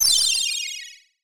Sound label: sprinkle